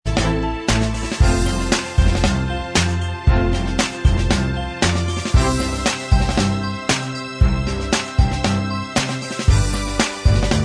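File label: Instrumental Track, backing tracks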